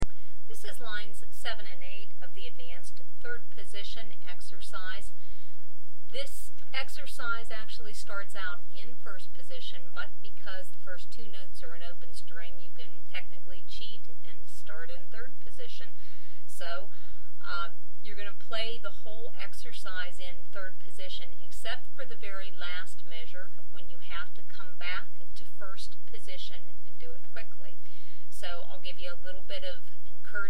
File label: Advanced 3rd Position Exercise Lines 7 and 8, mandolin